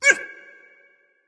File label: grunt hurt; smite; voicepack; osiris